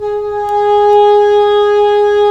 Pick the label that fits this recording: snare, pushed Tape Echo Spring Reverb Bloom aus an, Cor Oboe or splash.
Cor Oboe